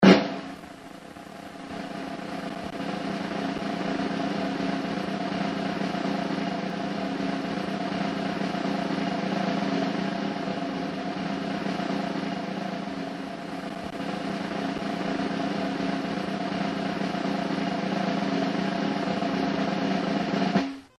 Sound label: drumrolls